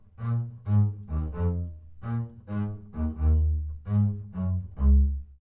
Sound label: double bass